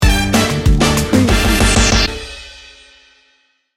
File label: samba party sting bumper